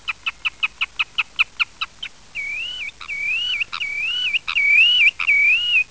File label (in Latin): Tringa erythropus